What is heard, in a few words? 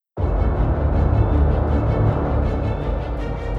Hybrid Trailer - Dubstep Version